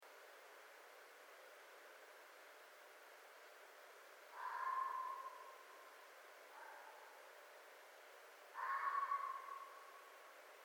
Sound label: Red Fox